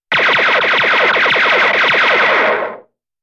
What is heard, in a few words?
Laser Shots